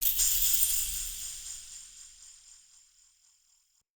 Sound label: Animote Idle Shake; Animal Motes